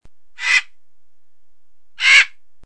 cuervo

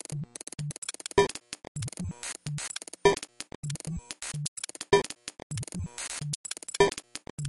golpearon

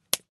golpe pelea

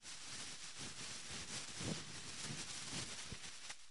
bolsa

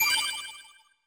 tono corto

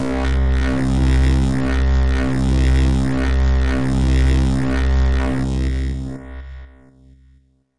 distorsionada

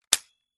juguetes juguete

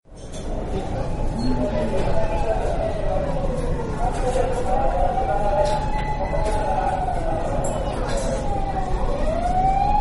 cafeteria

